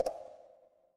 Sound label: ui pop